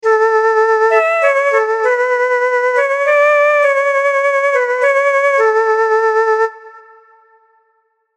lullaby; infinitefusion

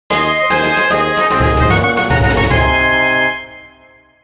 Evolution success; infinitefusion

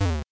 bump; infinitefusion

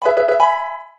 Level Up; DPP; infinitefusion

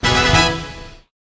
Level Up; SS; infinitefusion

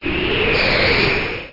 strong Wind; infinitefusion; cloud puzzle

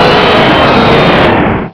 Cry; infinitefusion

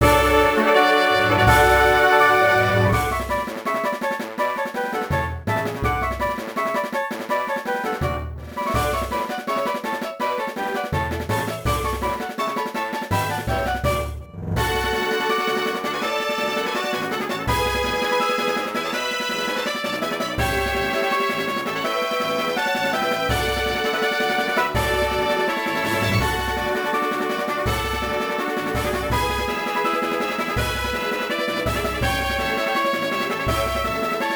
Battle victory leader; infinitefusion

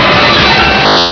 Cry; infinitefusion

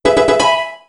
lvup; infinitefusion

